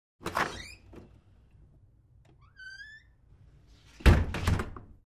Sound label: wooden door opens and closes with creak